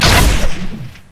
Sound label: tumble; LoL; vayne